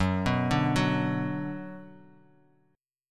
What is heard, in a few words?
Gb Suspended 4th Sharp 5th, chord; arpeggiated; 14,14,12,11